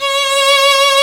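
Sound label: STR VLN, violin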